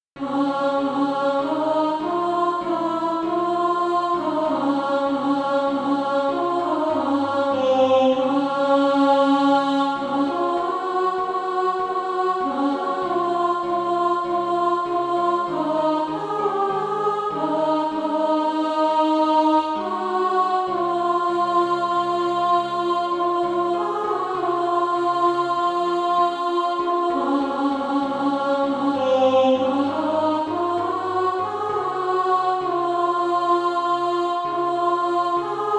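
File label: alto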